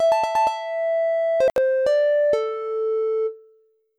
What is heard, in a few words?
Western Sting; Rolo to the Rescue